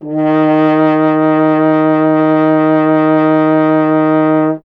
F. Horn; Miroslav Vitous - Solo Instruments VOL-2; Solo lnst. II

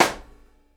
SNARE, Live Snares

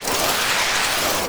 sfx sci fi; Masterbits - Switch FX

